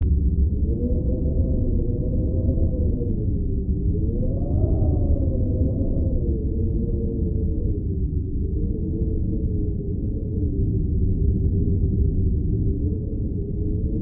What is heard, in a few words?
Ambient Heavy Wind; Kingdoms of Amalur Reckoning